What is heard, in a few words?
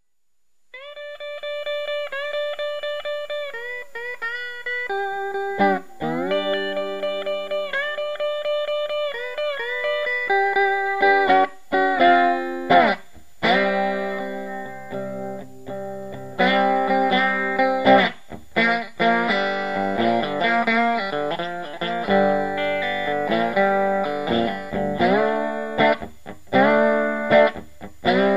blues